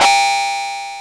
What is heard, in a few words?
SYNC WAV HI, INST WAVES